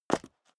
step high heels concrete grit single stair down; schritte; Footsteps; menschen

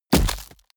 body fall wood harsh crunch; krieg-waffen; nahkampf; soundpool

